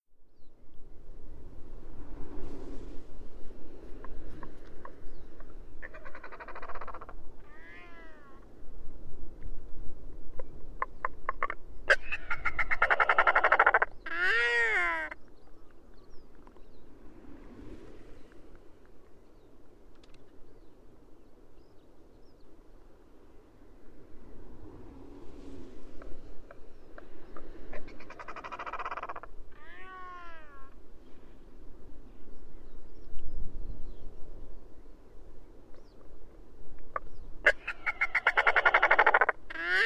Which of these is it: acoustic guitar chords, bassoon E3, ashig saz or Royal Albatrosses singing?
Royal Albatrosses singing